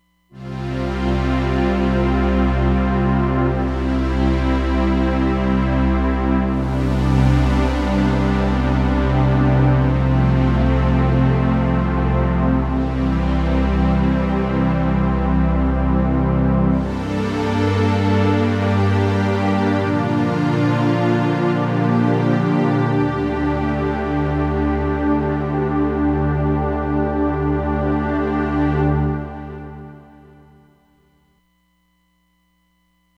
OB Slow Str, Roland Fantom XR

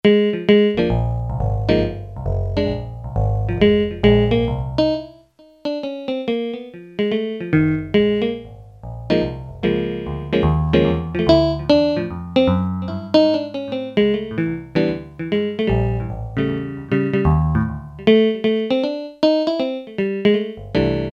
JAZZ GUITAR, Yamaha PSR-300; Voices

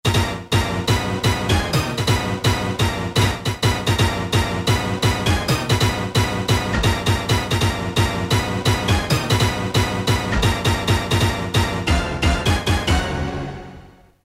Big Ol' Stab; Roland M-DC1

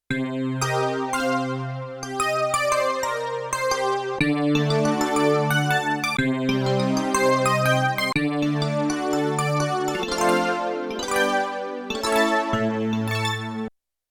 Spacey Keys, Yamaha TX16W; Blissperformance 2

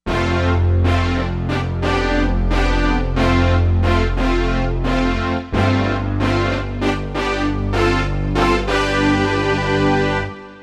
Stab Sweep, Korg MSC-07S Synth; Korg MSC-07S Synth 2

